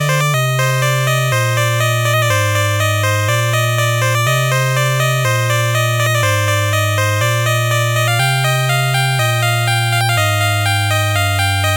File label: game over; shadowgate